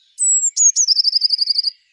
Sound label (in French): Mesange bleue chant